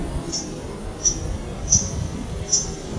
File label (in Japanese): uguisu; zukan